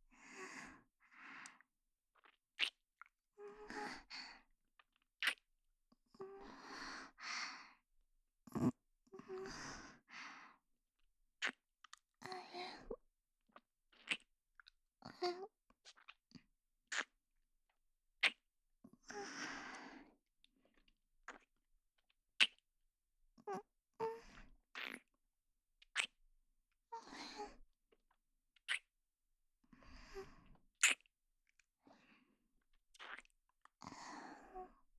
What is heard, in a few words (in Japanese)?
ディープキス